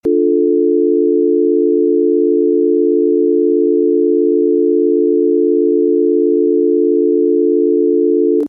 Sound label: Dmin, just tones